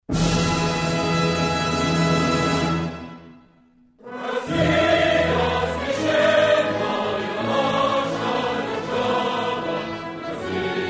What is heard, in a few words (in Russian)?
Gimn ROSSII korotkiy hor